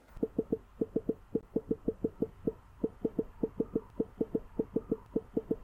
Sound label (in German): huawei mate 30 pro vibration tippen; Vibrationsmotoren im Smartphone